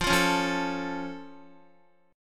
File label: F Augmented F down Guitar; strummed